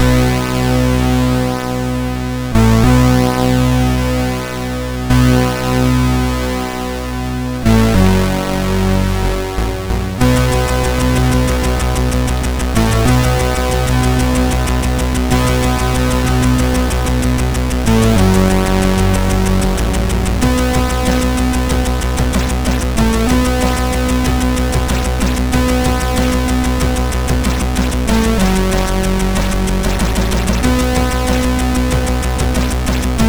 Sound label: sid MOS6581R4; D I S C the Game